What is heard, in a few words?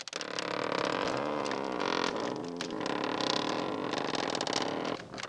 vane; Mafie 1